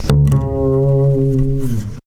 Double Bass F; Total Drum&Bass

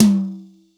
kit, Yamaha Motif-ES8

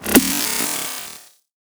Drums Snare (Grainy); neuro; BIG5AUDIO

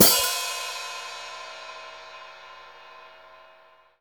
HH PD, Drum 1 Dry; East Collexion

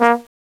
TROMBONE, Fairlight CMI, BRASS

